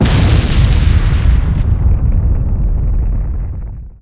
mass driver explode; Tanks

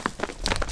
rocks; Tanks

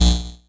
fuzz bounce; Tanks

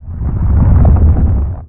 rock short; Tanks

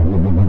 phasemissile; Tanks

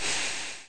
fizz bombs; Tanks

